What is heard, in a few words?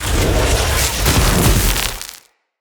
Casting Device Finisher Front; Weapons; Player